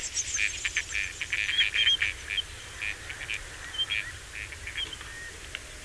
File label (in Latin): Anas strepera; pticy; utki